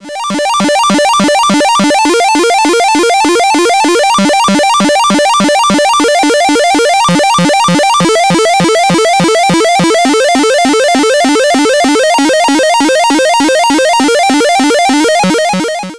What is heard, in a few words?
Retro Arp; Soulsby Oscitron